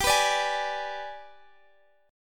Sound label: Ab Minor Major 7th Flat 5th chord